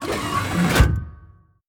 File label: gun reload; World of Tanks